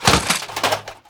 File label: Rocket Launcher Death